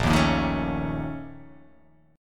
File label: A sharp Minor Major 7th Double Flat 5th A sharp